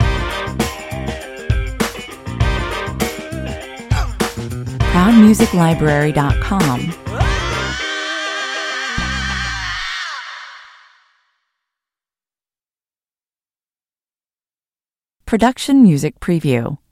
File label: funkin yell stinger